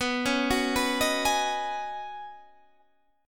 C sharp 7th Suspended 2nd Suspended 4th B

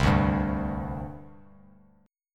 C Suspended 2nd C Bass Standard; strummed; JGuitar

